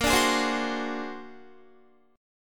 Bb Augmented 9th Bb, Guitar; Bb+9; strummed

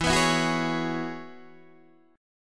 E 6th Add 9th E down Guitar DADGAD

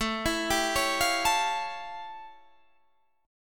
A 7th Flat 13th; A7b13 arpeggiated; Guitar; JGuitar

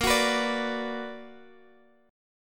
B Minor Major 7th Bb, chord

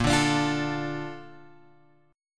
A sharp Major 7th; A#M7 Chord {6 5 X 7 6 5} strummed

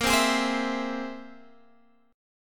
C Augmented 7th Bb

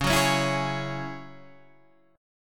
Gsharp 7th Suspended 4th Sharp 5th Csharp, Guitar

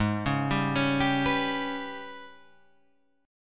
G sharp Minor Major 7th Double Flat 5th; x,x,6,6,7,8,9,8

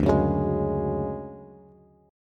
D-Major Sharp 11th, D, Bass, Bass Standard; strummed; JGuitar

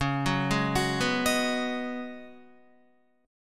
C sharp 9th Suspended 4th, C sharp, down, Guitar; arpeggiated; chord

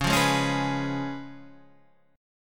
C sharp 7th, C sharp, down

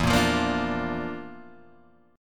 Bb Major E; Bb/E strummed; JGuitar

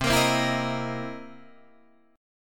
C 7th Sharp 9th, Guitar, Open D

